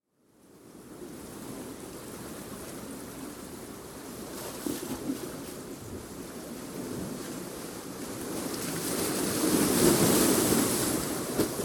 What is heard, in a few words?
Sunrise Surf